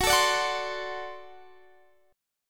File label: D 7th Gb, guitar